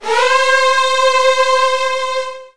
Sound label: Virtuoso Strings C3; ILIO; Kirk Hunter Virtuoso Strings VOL-2